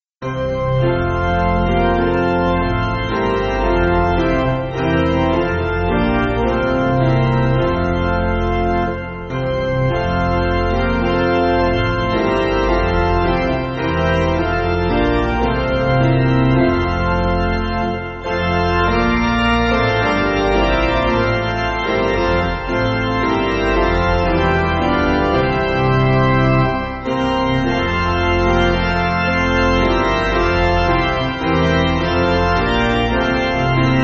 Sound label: Piano